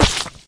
Splat

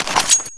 knife deploy; weapons; CS ForKids

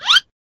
Cartoon Slip

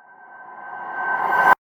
Bell Reverse

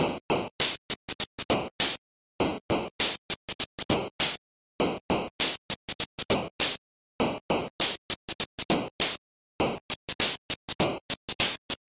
the 8-bit noise

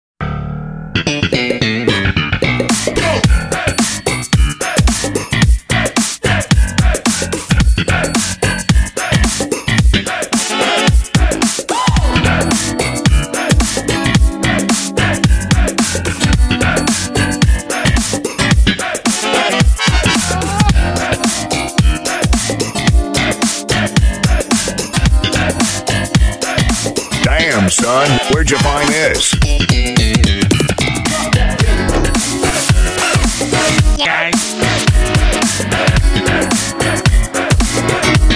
Glitch Hop Remix

